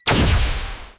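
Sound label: low frequency energy blast, cybernetic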